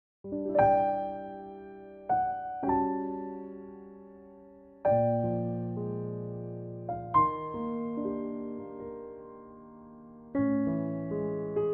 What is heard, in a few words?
C piano trak; key - C - vocal range - B to D; beautiful and intimate piano only arrangement